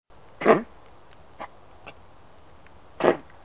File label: snort